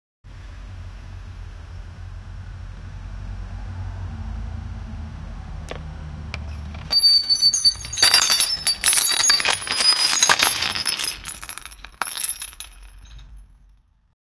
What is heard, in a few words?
the sound of a car, breaking glass